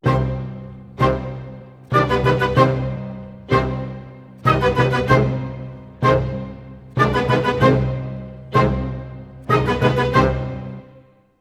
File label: 95 c min full mix timpani, Orchestral arrangements